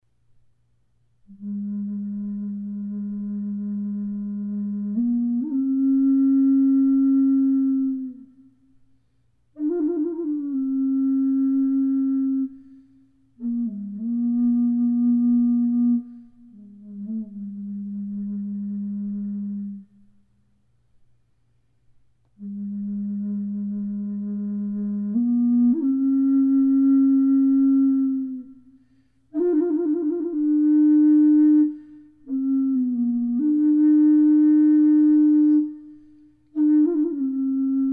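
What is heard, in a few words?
G3 Sharp Large Bore Flute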